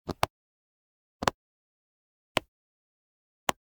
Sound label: Generic Button Wood Small; boom library; The Interface